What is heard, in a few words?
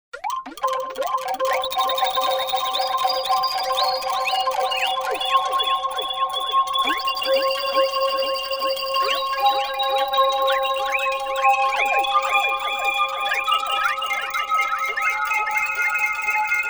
Space birds; Constellation M42